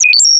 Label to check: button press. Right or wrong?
Right